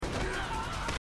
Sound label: Death Scream; 24 Promo